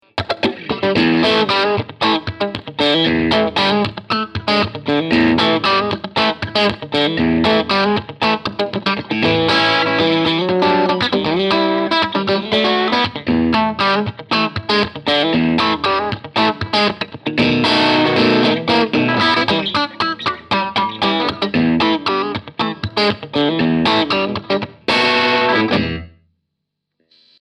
Tone King Gremlin Tweed Light Crunch; 70th Anniversary

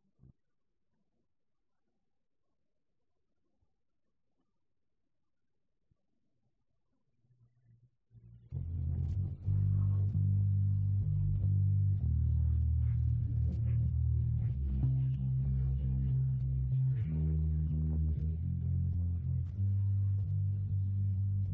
Bass

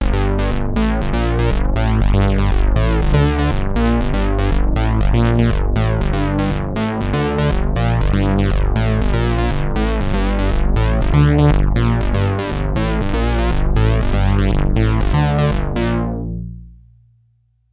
Nina Bass; Melbourne; Autoban

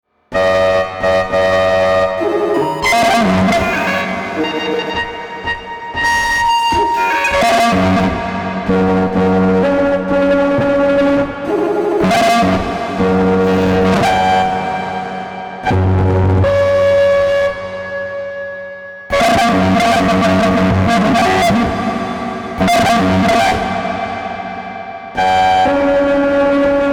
Korg Kaoss Replay Synthesizer Resampling FX

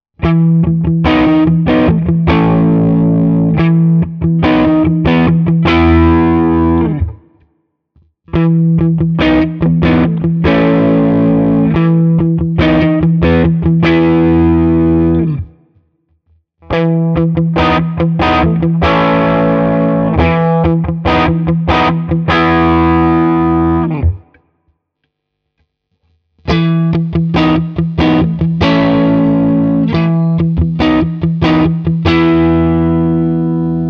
Hagstroem low gain Riff

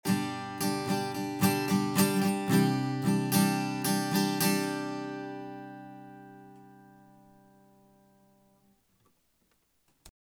SSL SiX Shure Guitar reduziert; SPL Transient

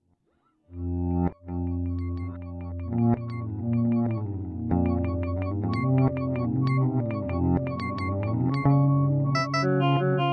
Particle Pitch Madness

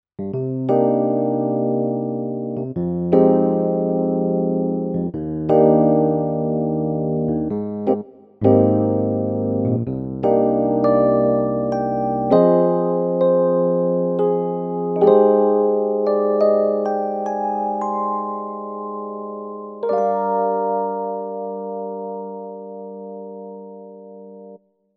Casio PX-S5000 E-Piano

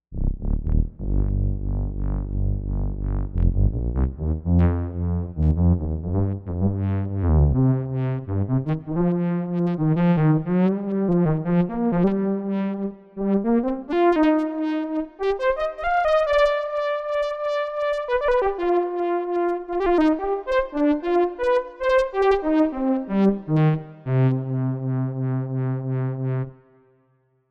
Kobol Brass Filter FM

